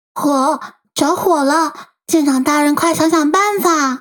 Alarms Fire Alarm; WoWoNiang

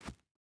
footstep hide sand jump start; Rust

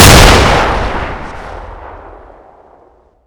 Inf Weapons; Red Orchestra 41-45

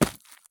sprint gravel; Escape from Tarkov

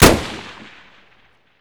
mosin outdoor distant; Escape from Tarkov

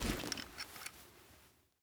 thompson deploy; Rust

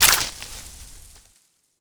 leaf small

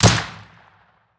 bolt rifle attack silenced; Rust

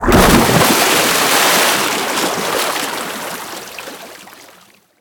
impacts; World of Tanks

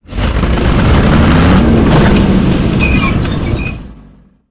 panzer4 engine load, tanks; War Thunder

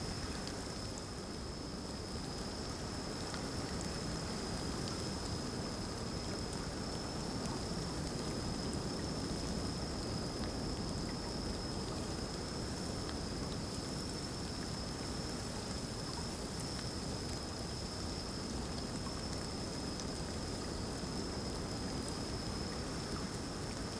ambience field night breeze drizzle; Rust

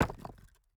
phys impact stone; Rust

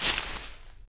Foot Forest; Civ4 beyond sword

